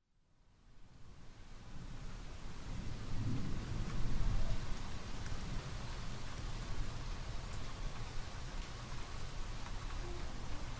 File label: thunder music